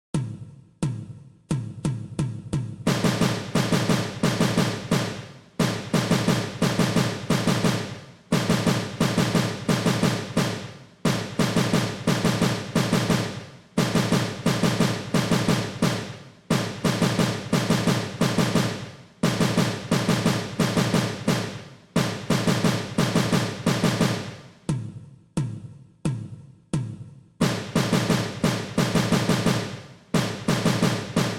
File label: Taps - Diddles @ 88 bpm, percussion; percussion methods